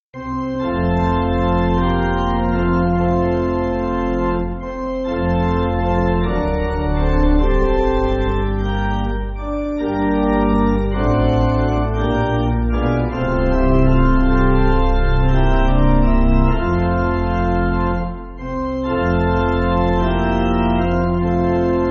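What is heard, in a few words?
Pipe Organ, church music; Abode Of Peace; I Rosen Doft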